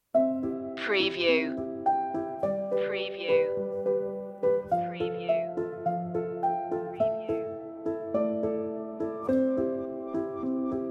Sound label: joy is in the air no electric guitar no glock